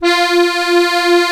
DIATONISCH, AKKORDEON, Alpine Volksmusik

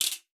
RATSCHE; Xsample VOL-9

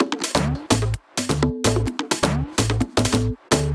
Drum Loop 128BPM ohne Clap

